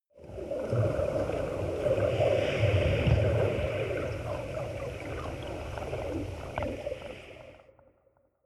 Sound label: water fx